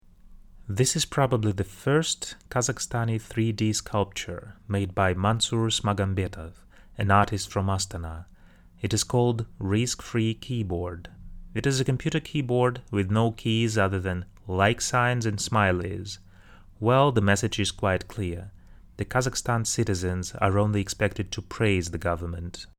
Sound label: keyboard